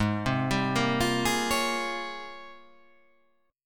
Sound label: G sharp Major 13th; x,11,10,12,10,12,10,10